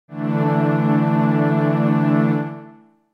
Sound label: D D Suspended2